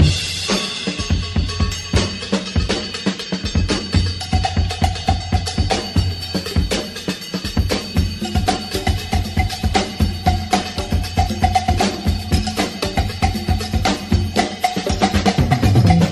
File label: Drum loops, Funky